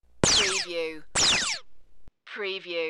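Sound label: Lazer Gun 2 Shot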